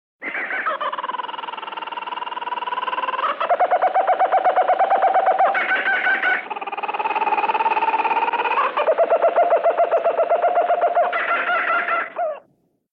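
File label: kookaburra; animals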